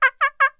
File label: Monkey Idle; angry birds rio